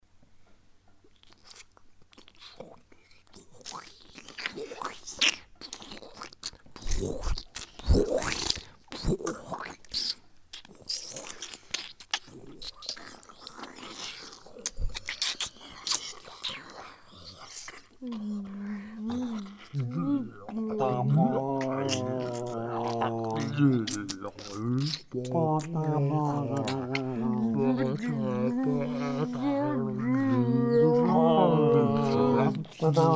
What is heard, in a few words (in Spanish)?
hipopotamos